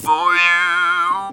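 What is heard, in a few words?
"for you", Talk Box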